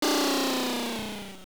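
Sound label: Boss Scream Distant, Dungeon bosses screaming (distant); LOZFDS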